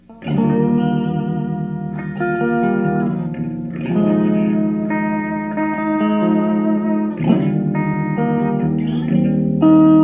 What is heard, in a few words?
Germanium Fuzz Face Replica; Chase Tone